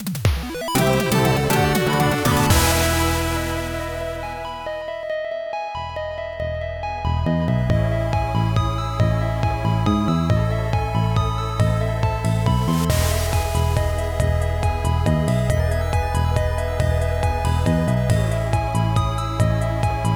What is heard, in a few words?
Victory - Puzzle; dungeons of aether; macos windows gamerip 2022